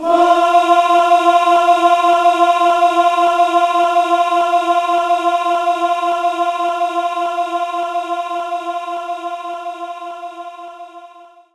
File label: Concert Choir F4; knights try